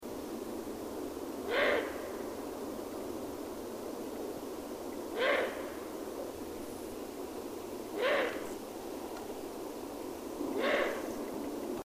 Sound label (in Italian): Gufo reale